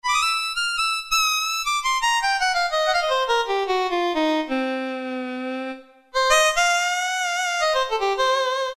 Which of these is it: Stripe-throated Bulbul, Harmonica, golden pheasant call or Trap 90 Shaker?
Harmonica